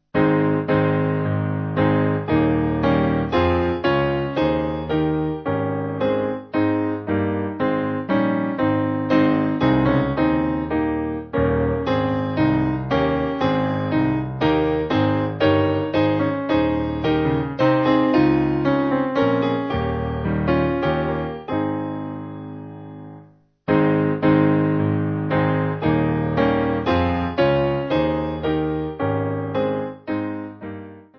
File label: Piano